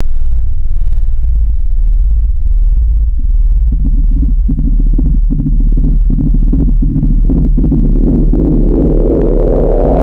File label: descending synthetic hum with pulsing oscillation; designed